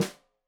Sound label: Snare Stereo, Drums; Deeply Sampled Drums